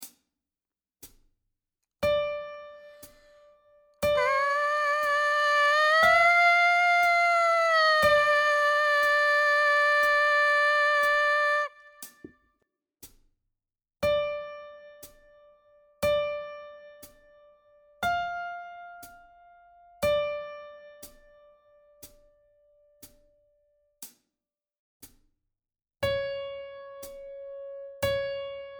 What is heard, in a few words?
Mpc buzz line